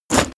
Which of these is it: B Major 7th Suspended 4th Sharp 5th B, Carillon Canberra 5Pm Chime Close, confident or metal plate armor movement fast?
metal plate armor movement fast